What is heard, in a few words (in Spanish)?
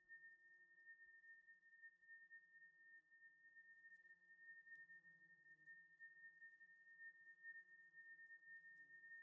abejas; basel; choir of species